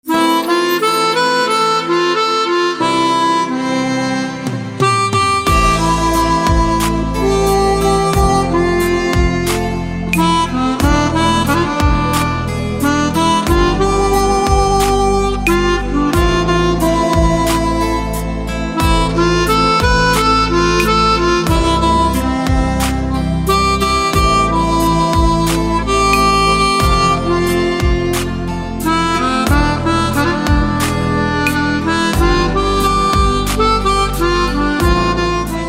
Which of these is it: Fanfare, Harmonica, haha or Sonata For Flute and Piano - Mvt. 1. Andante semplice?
Harmonica